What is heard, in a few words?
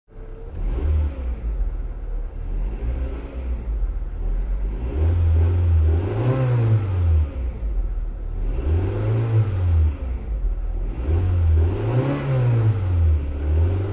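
Car Interior Engine Revs Many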